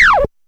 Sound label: SQUEEK; BEAT2000